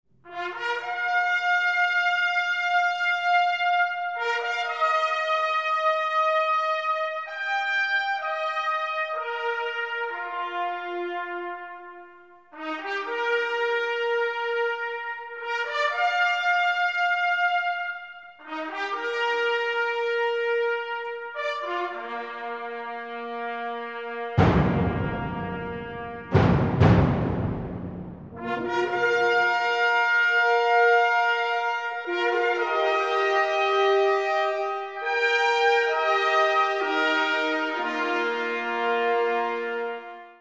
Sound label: fanfare, Harmonieorchester